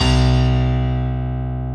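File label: dance piano B0; Best Service Advanced Media Trax Modern Composer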